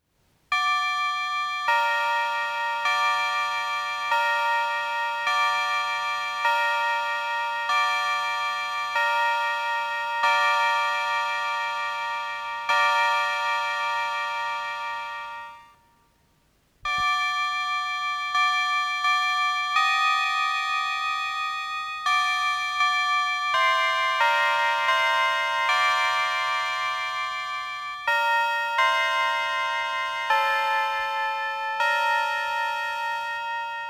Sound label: chime